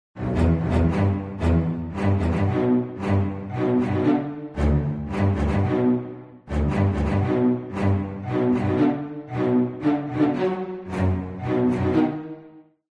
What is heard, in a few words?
staccato strings